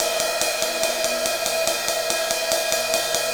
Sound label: Ride Cymbal Pattern